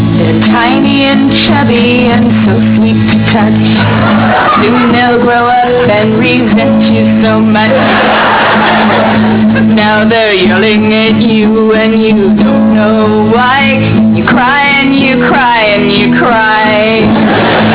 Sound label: cry